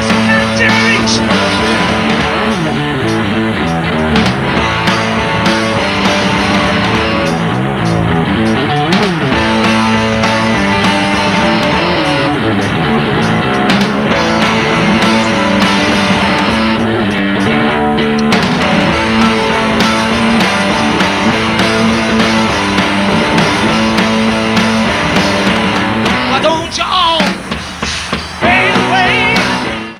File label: Bass Solo